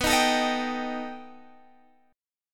B Augmented 7th B down Guitar Half Step Down

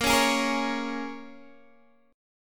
Bb Minor 7th Bb, Guitar, DADGAD; Bbm7 strummed

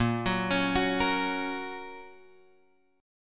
Bb Minor Sharp 5th Bb down Guitar Drop D

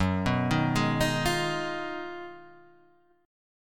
G sharp Minor 13th F sharp, guitar chord; G#m13/F#; arpeggiated; JGuitar

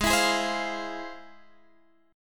F Minor Major 7th, chord

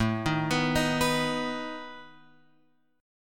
A Suspended 2nd Flat 5th, Guitar, Open F; Asus2b5; arpeggiated; x,4,3,6,6,6; JGuitar

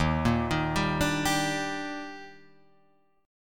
Eb Major 7th, Guitar Drop D

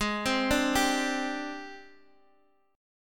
Ab Major 7th Flat 5th Ab Guitar Open G

